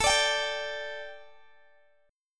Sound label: A 7th Suspended 4th Guitar Open G, chord